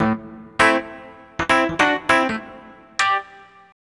keys, loops, phonky

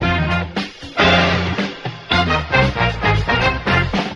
horns, phonky loops

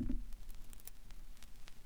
crackles, Vinyl

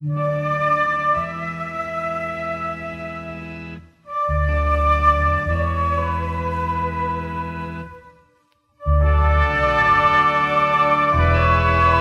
flute presentation, Loops, Jazzmatazz

